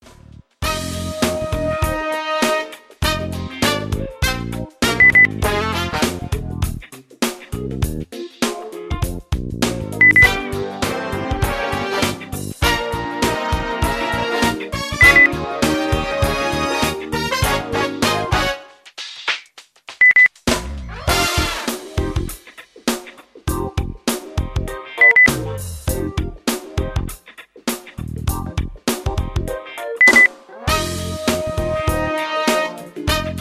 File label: jazz late night show